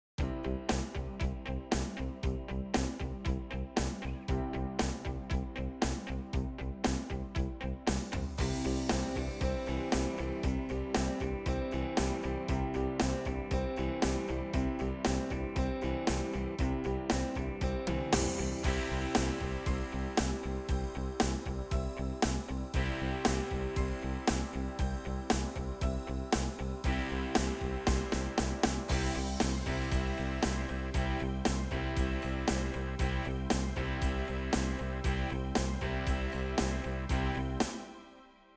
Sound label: 80s Guitar Pop, PSR SX900; Styles im PSR SX700 und PSR SX900 unterschiedlich